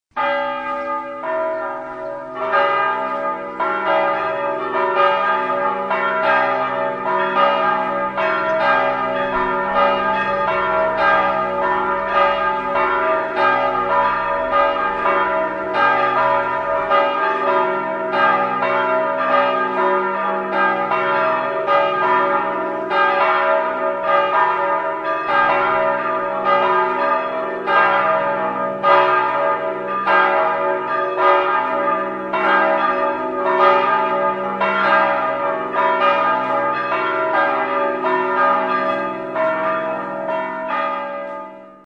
HARANGSZÓ CSIKSOMLYÓ; GYULAFEHERVAR

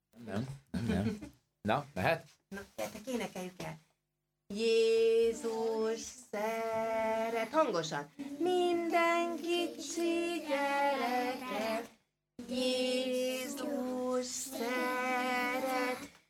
Kiscsoport dal; GYEREKDICSOITES

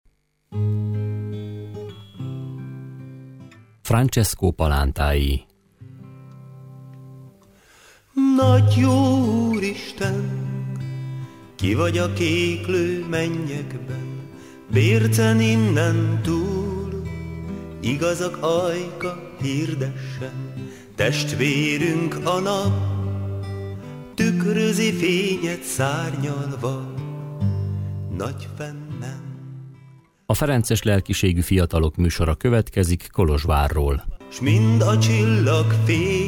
Szignal; Francesco palantai

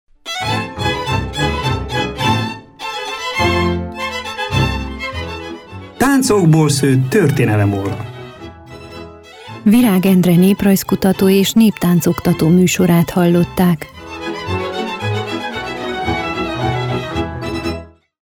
Jingle VEGE; Tancokbol szott tortenelemora

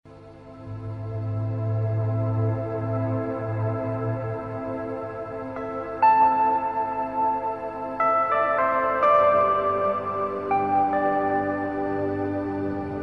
jingle eleje hosszu; Lelekido

